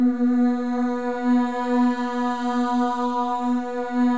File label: MOVING V3, Symphony of Voices; Spectrasonics